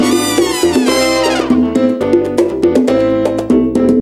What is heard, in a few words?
Club Cubano, ringtones; Kyocera DuraForce Pro